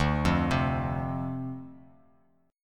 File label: D-Minor 7th D, chord; Dm7; arpeggiated